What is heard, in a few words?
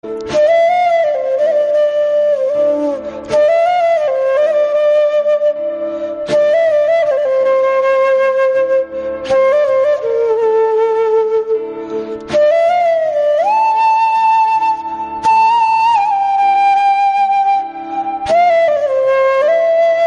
flute ringtone; Bahubali 2